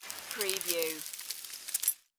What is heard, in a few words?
Ice Freeze Super Power; Sound Effects Bible